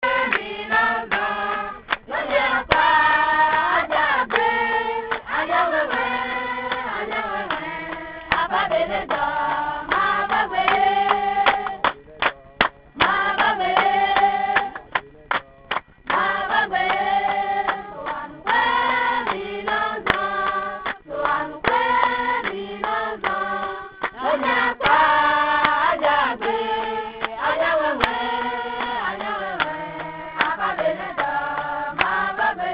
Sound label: Chant